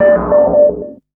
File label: loop; X-Static Goldmine 3